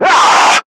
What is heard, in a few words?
Screamer Pain; Warframe; Lasrian